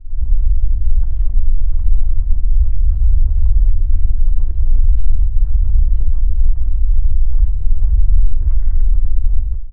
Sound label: earth rumble; sslc2022